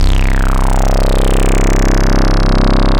REZ SYNC, ARP Synths; E-MU Formula 4000 Series Vol. 3; Analog Odyssey

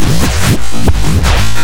RING LOOP; Big Beat Samples & More; AKAI; ALL LOOP KIT

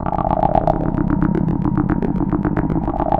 C1, SynthLine; 90 s Sample CDs; NiceBeats; SynthLine VOL-5

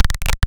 Vactrol Acid; Cwejman S1; DevSnd Cwejman S1 - JK

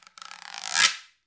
Guiro Wood; Club-50 - Foundations Roland

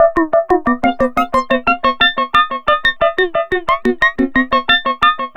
Serge, Synths Arpeggios; Electronic Point Blank

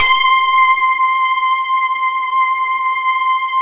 SQ1, Synthesizers

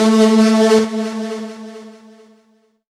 technosyn2, Techno Trance; Ueberschall; SYNTH

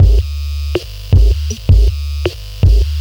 Structured Rhythm, IDM Rhythms

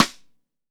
METAL, RIM SHOT

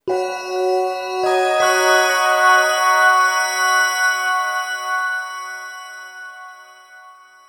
Bell; Sonic Foundry (Sony Creative Software); James Johnson Spektral Minimalism; Sublime Keys; Bells

